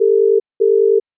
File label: alerting; 3CXPhone